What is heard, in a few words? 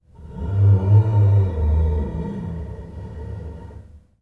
String Rub, piano; Piano Miscellaneous